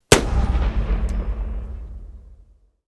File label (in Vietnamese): tieng sung ban 1 am duy nhat rat gion